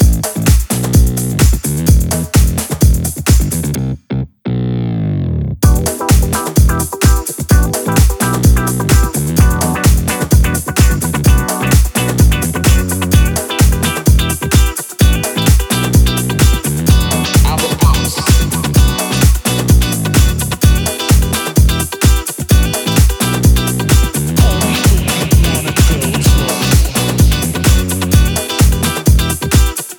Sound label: French Disco House Mc